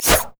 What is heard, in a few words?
dance strike; LoL; fiora